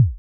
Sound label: splatter thud; cake murder adventure